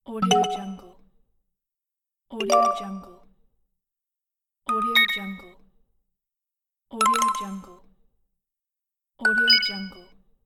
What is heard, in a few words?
optimistic casual games notifications